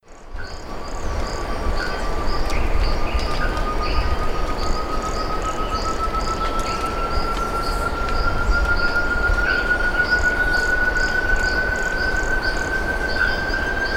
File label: Chamaeza meruloides; Nc Ipor; PETAR; SP; Silveira